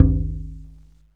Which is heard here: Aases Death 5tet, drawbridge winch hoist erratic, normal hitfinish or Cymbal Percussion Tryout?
normal hitfinish